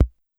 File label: Kick, Old Skool House; Big Fish Audio; Marshmellow; Construction Kits